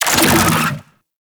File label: Infested Move Start; Warframe